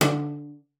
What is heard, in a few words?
CELLO FINGER D3; Xsample VOL-5